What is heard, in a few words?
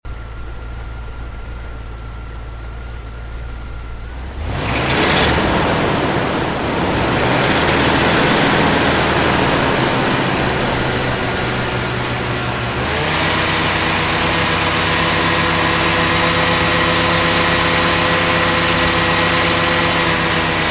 Dodge Ram